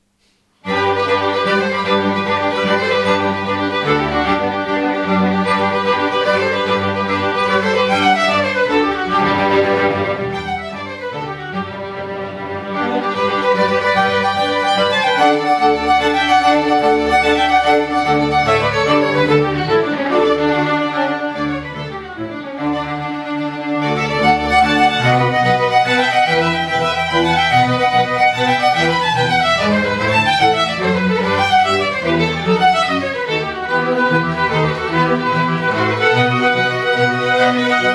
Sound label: String Ensemble; Trasimeno String Ensemble; Concerto alla rustica I